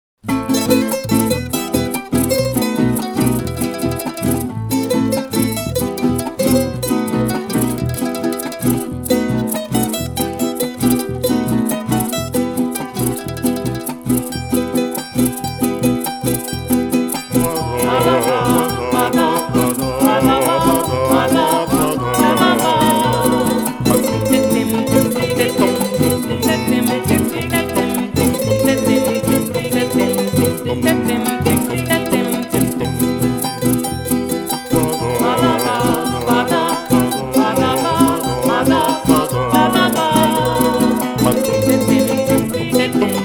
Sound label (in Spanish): INTRO Ensamble Vocal